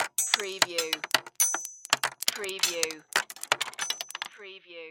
Debris Stones Metal

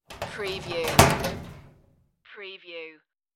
lock metal locker, the sound pack tree

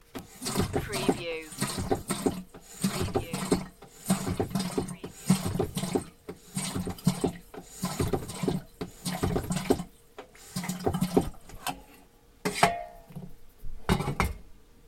Pump tap; beanstalk audio

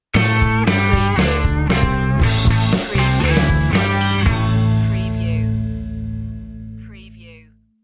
rock sitcom ident

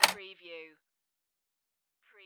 Pinball sound, switch or lever

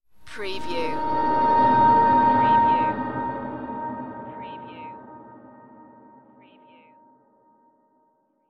spacecraft flyby drone

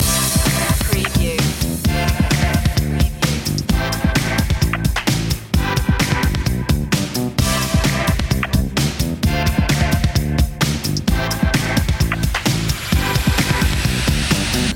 electro cool loop; media music now

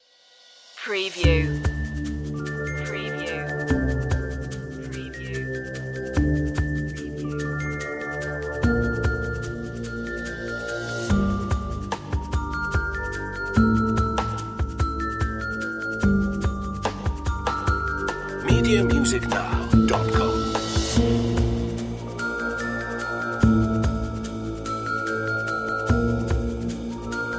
quandrism no marimba

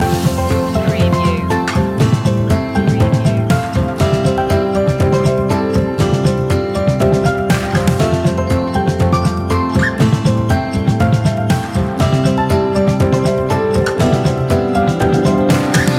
a warm welcome loop

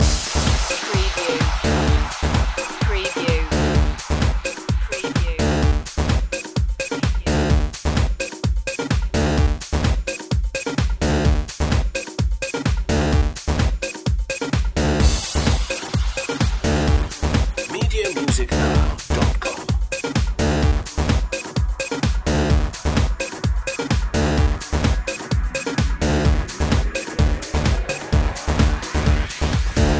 bouncy bouncy loop

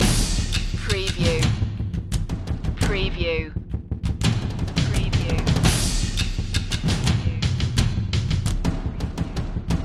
percussion loop

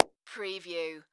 Hand Clap Dull Single

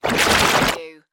Whoosh; Sound Effects Bible

